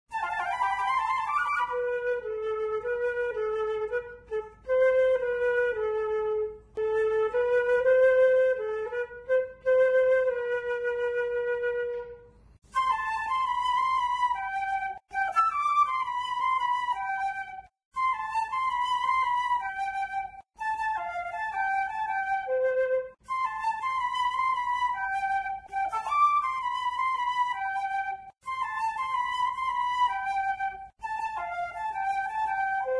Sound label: Flute; Zomboid; Lifestyle